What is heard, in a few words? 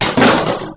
chair fall